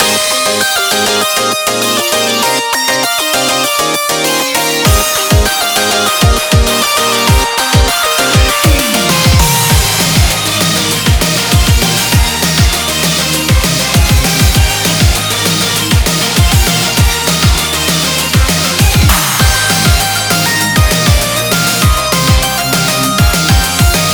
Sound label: BGM Reach